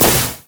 grenade; rumble

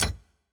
ui generic button click; LoL; hud

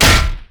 basicattack human; LoL; shyvana

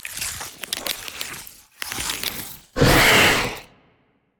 raw idle; khazix

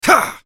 effort; Yasuo; en US

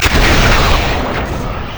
attack; Xerath

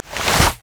basicattack draw; LoL; varus

